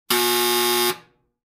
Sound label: buzzer